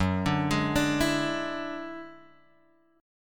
F sharp 7th Suspended 2nd Sharp 5th, Guitar, Drop D